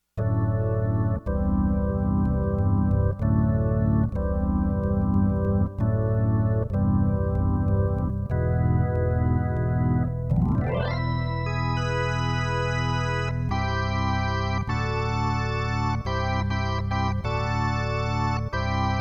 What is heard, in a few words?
organ; MegaEpix